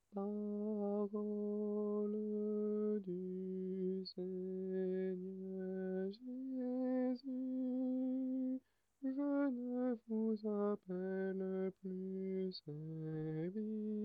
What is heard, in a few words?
tenor; Parole du Seigneur Jesus; mariage